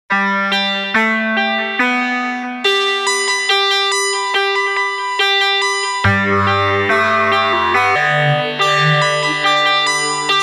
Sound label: Buchla